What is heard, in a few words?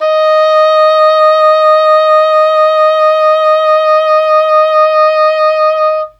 English Horn D#4; Standard Instr